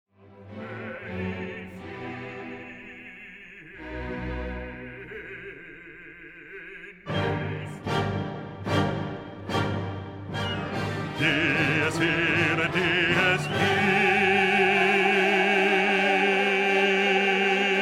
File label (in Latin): Dies irae, Requiem; requiem verdi